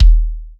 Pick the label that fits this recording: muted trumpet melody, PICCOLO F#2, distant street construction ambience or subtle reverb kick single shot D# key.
subtle reverb kick single shot D# key